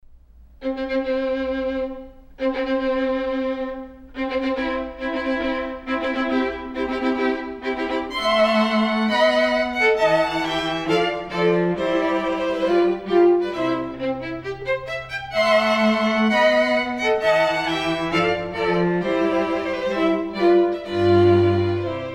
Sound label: wedding march quartet; Wedding March, Mendelssohn; Bridal Party or Bridal Processional